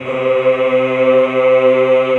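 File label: Choir C2, Ehh; Eeh + Ehh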